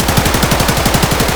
rpk16 indoor distant loop; Escape from Tarkov

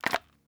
water bottle remove cap; Rust

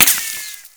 impact snow; weapons; Modern Warfare

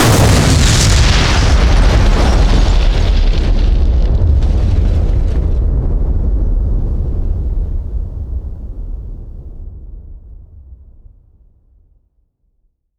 Explosions Explode Ground; DCS

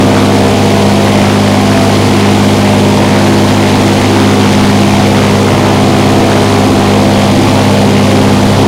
morava rear, engines; War Thunder

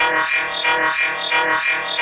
Health Warning Loop; Titanfall 2

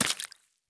run puddle; Escape from Tarkov

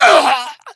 death

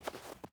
footstep hide snow walk; Rust

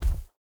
bear footstep grass hard; Rust

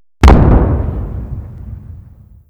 Vehicle Weapons

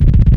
loop l21a1 bass; guns; tanks; War Thunder

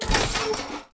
Artillery Fort; Civ4 warlords

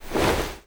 foley gear rattle walk

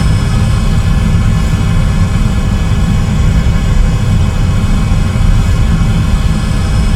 jet cockpit, engines; War Thunder

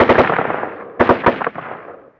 gun ak47 dist

